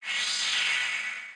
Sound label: Map Transform